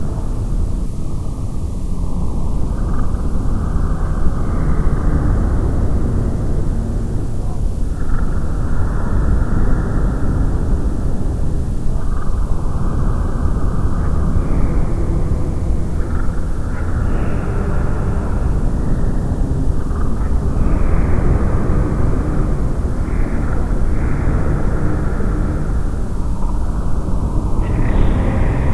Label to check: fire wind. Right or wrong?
Right